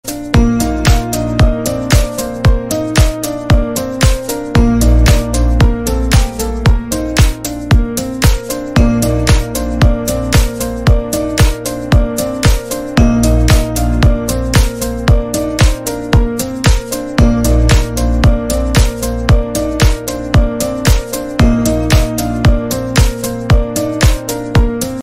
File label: ringtone instrumental ringtone